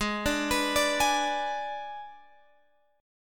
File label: G sharp Diminished G sharp, Guitar Open G